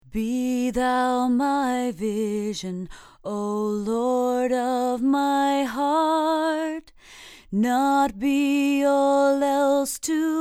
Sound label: female vocal sample